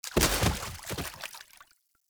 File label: Battle Dog Bodyfall Water, Foley